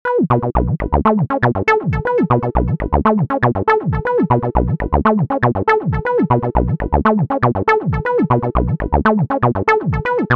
acid classic; abx3 by audioblast

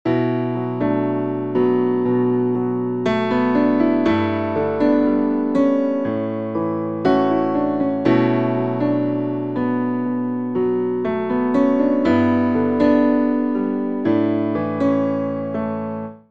piano moving on dry; chiral audio

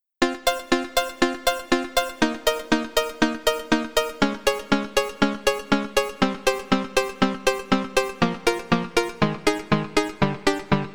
arp pluckshort; the transmitter soundset

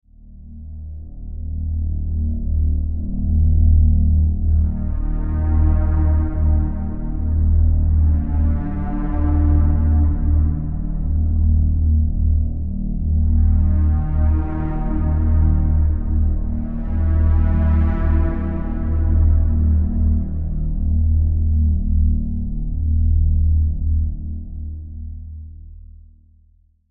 drone question

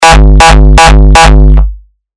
kick 160 bpm; holle buis

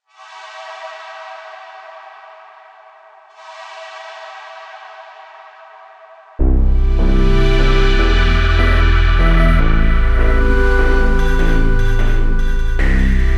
lo-fi electronica; lo-fi electronica for serum; patchmaker

